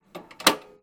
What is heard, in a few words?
Gramophone Open; Silent Hill Downpour